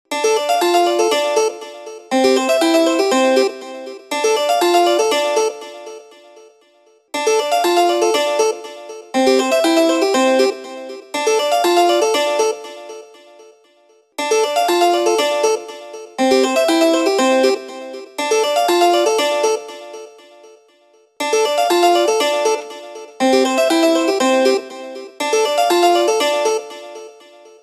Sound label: French Garden classical; Windows Mobile ringtones; Matthew Bennett